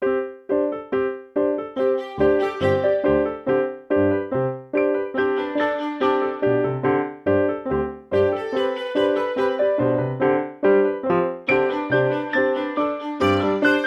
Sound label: Piano Pulse